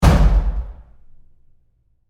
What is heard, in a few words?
Door Bathroom Stall Close Roomy Very Hard; Big Room Sound